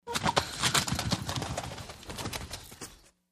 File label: Hen Flap Wing, chickens